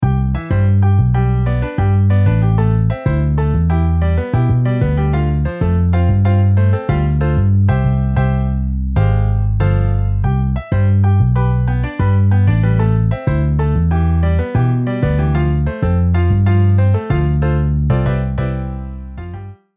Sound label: Piano Bass MIDI